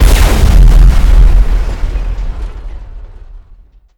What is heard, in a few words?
heavy laser; weapon